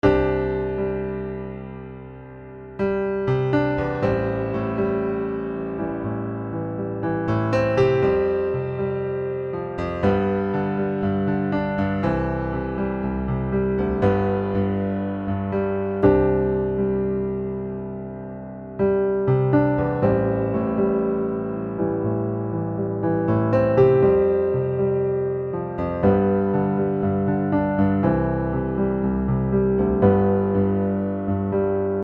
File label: filters Piano 1073; arturia; PianoV2